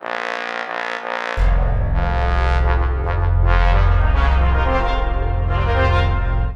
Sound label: Sea Fanfare